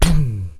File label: VOX; RAP CITY 1